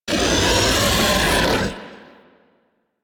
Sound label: Vocal Attack Short, Enemies; Warframe; Teralyst; Ropalolyst